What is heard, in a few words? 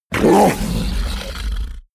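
fishman bark, creatures; kreaturen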